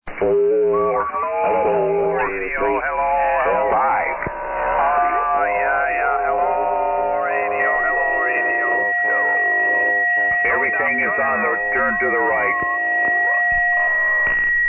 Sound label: SSB Net Tune Up